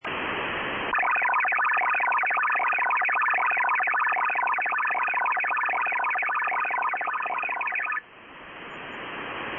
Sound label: ALE SND